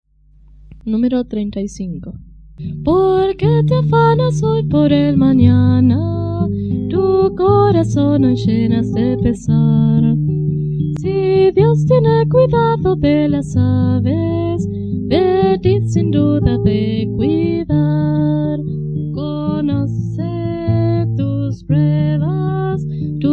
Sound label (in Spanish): Coro, himnario niños